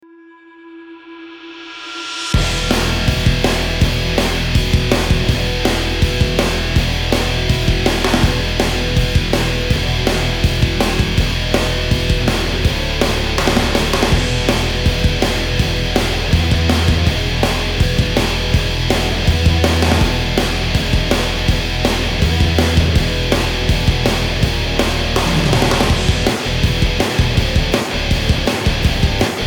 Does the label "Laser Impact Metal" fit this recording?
No